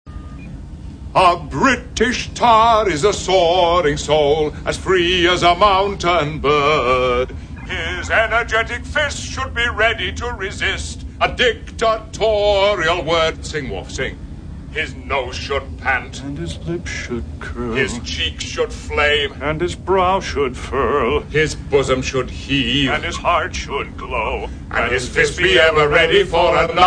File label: singing